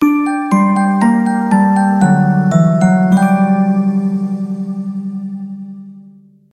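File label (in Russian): muzykalnyi konec, musical ending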